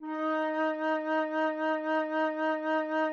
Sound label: flute Eb4